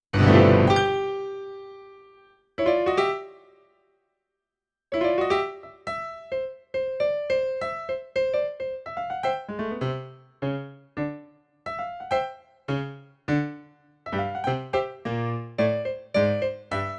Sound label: Soprano accompaniment